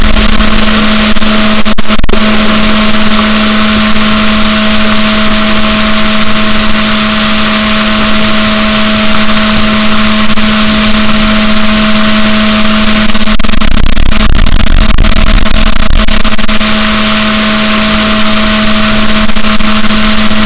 rorquals negatif, boat

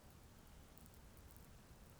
pulses, BALAENOPTERA; MONACO 2022-07

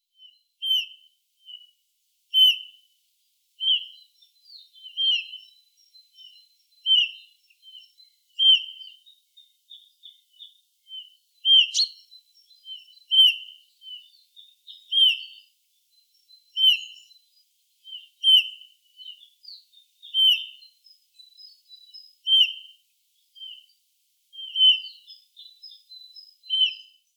Pyrrhula pyrrhula; SETE

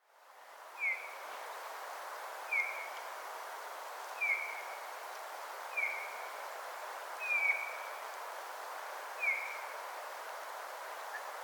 Pyrrhula pyrrhula; SETE

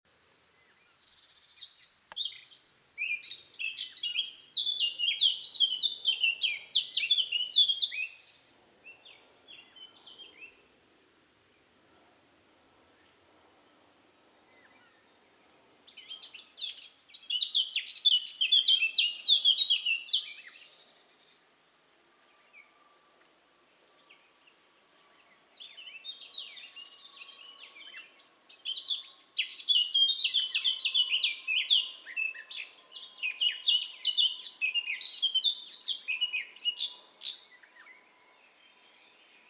Sylvia atricapilla; SETE

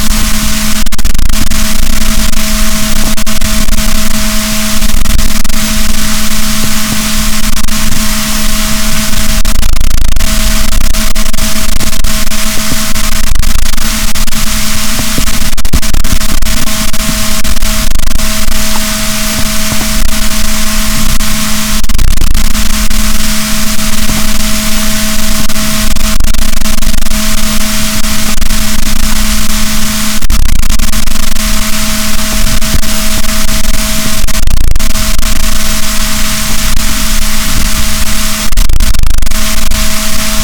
rorquals negatif, boat; Chile

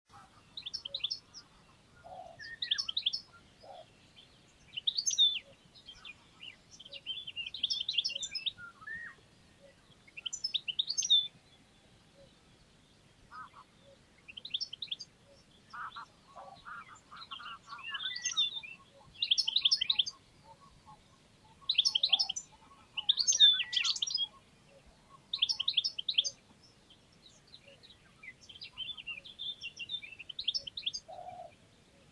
Carduelis carduelis; SETE

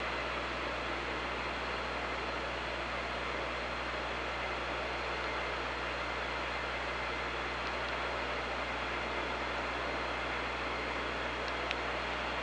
OrcaLab

